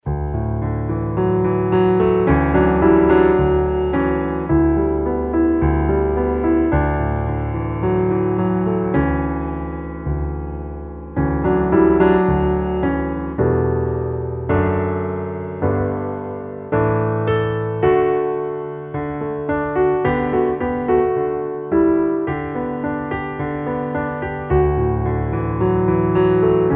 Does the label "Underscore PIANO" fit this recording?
Yes